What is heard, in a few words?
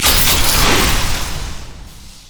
gravitation field; LoL; viktor